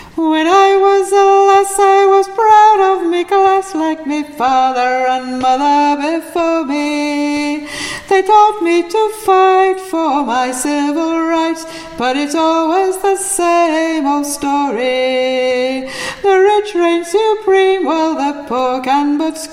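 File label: soprano Verse, singers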